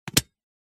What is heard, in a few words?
Padlock-lock